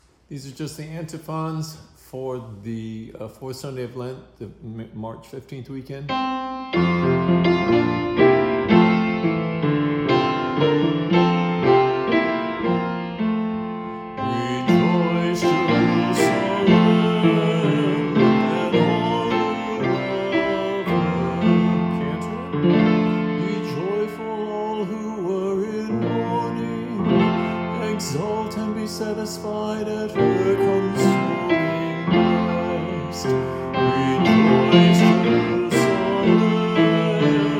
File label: Antiphons